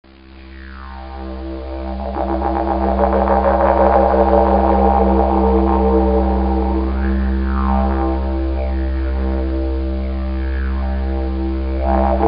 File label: kookaburra, didge